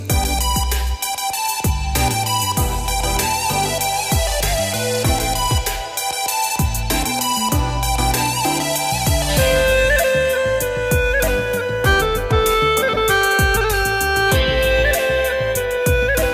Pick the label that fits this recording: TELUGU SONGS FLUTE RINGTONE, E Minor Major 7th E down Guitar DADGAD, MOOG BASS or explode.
TELUGU SONGS FLUTE RINGTONE